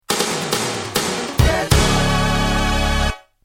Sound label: goal music; LeopardCats